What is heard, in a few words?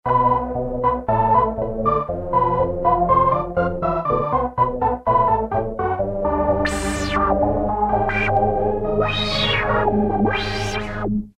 Evol Synth; Roland M-DC1